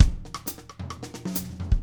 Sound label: samba, drumscores; 90s sample CD